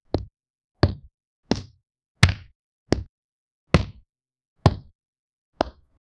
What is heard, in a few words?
the sound of hitting a, basketball